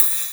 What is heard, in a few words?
Ride; Vengeance Samples; VEE Cymbals